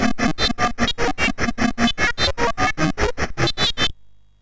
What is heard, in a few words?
Final Synth Sample, MOS6581R3